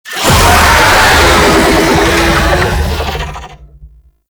Vocal Attack, Infested; Warframe

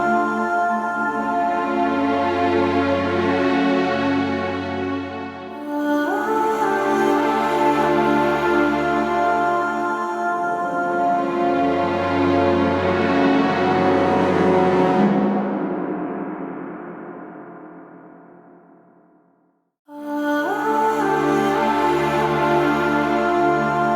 Music; Warframe; Cinematics; War Within

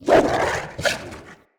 Pack Wolf Attack Bite; Animals

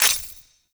UI Mod Install; Warframe; Railjack; Player Ship

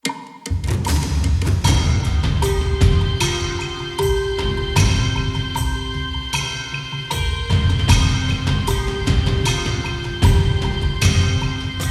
Ambient Night; Eidolon; Cetus

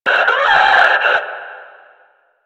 Death, Enemy, Barks, Battle; Warframe; Lasrian; Lasrian Enemy C

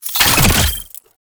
Exterminate Key Deposit; Warframe; Nineteeen Ninety Nine

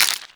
Infested Sniper Rifle Mech; Weapons

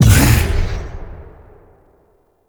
Bowl Jump Second B; Warframe; Grendel

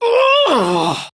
Pain Female; Warframe; Tau; Prime Grineer Female; Battle

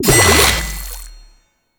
Water Sprayer Reload Perfect; Warframe; Weapons

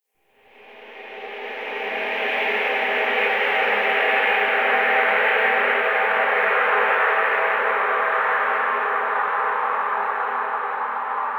Exterior Drifting Energy; Warframe; RailJack; Ambience

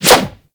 Whoosh, weapons; Warframe; Tenno; Ankyros; Prime Ankyros

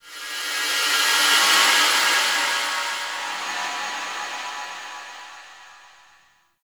Mid Texture, Ambience; Zariman; Warframe; TheNewWar

